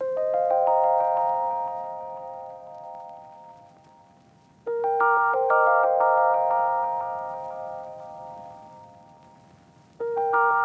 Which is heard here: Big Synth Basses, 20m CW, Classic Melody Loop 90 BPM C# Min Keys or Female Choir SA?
Classic Melody Loop 90 BPM C# Min Keys